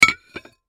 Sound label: Bowl Stack Plate, Bowl Stack Plate Dish; Dishware; Household; Dishware Sound Effects; Blastwave